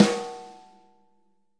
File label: Sn, Real Kit; REAL LIVE KITS